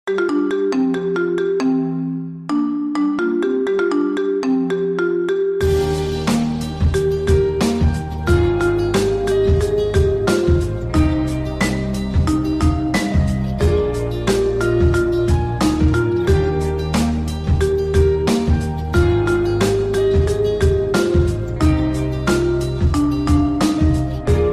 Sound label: Marimba Remix